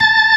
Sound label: ORG, Organs